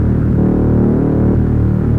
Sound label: monodelay D#m 129, Recorded with monotron delay and monotron - analogue rib; instruments; loops